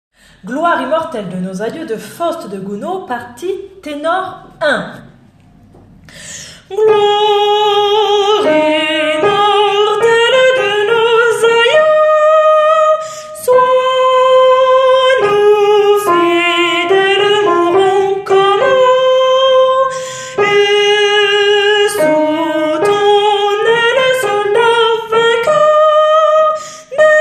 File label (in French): ténor